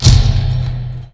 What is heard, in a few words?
RELOAD; NIHILIST